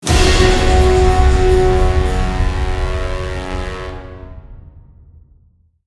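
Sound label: Music Stinger Snow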